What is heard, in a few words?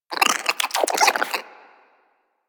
Vocal Idle, Hunting Critters; Warframe; Cryptilex